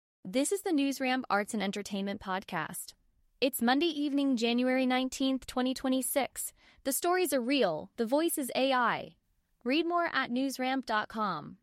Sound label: haunting instrumental